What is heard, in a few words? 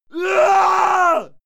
male fight scream desperate; schreie; menschen; soundpool